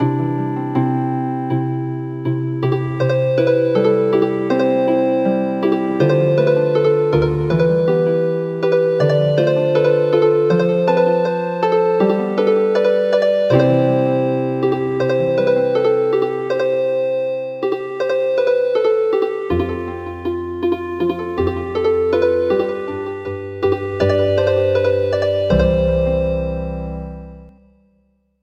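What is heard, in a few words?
Healing Harp; The wooden clogs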